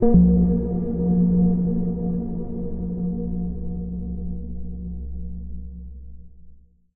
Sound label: editor blips; Source Engine; Portal 2